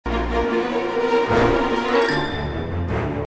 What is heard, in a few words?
orchestra effects, Xylophone making some transitions; pitched percussion; epic orchestral track